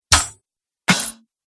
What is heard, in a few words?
Battle War 2 Metallic Strikes; Battle Sound Effects